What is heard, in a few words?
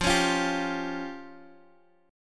C sharp Major Flat 5th, chord